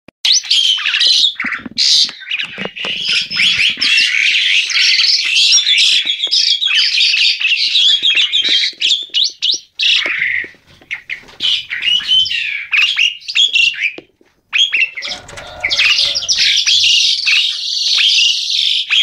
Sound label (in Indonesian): Kicau Jalak Suren Jantan